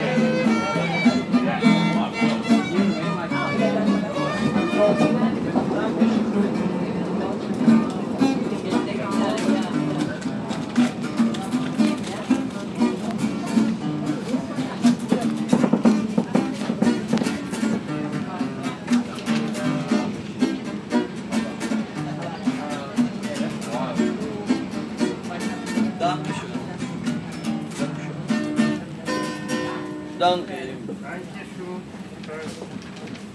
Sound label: subway music